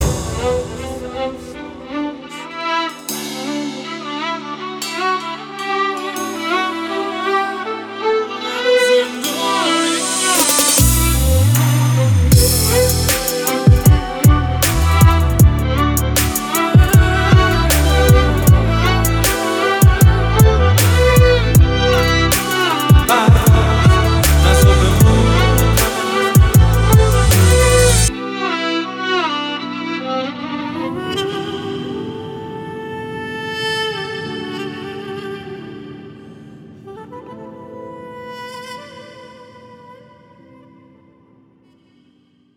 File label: ringtones